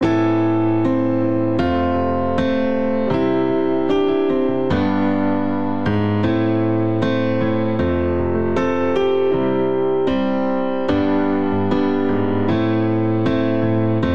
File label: Progression Pop Heavy Handed Dramatic vi - IV - I - V C Major 77 bpm, Grand Piano; MIDI Clips; Ableton; Factory Packs